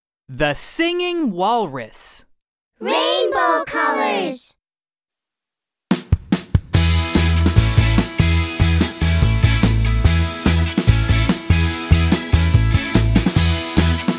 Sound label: Rainbow Colors Song Colors Song for Kids